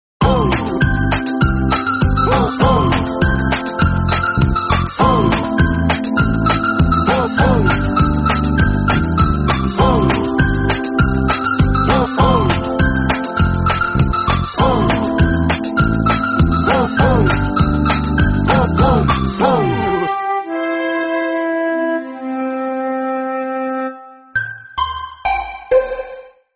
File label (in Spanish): Musica para publicidad y Jingles; doglovers supersonicamusica